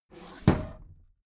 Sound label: desk drawer close heavy